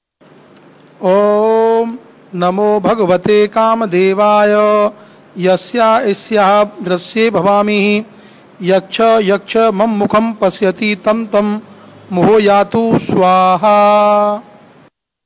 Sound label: kamdeva mantra; power stone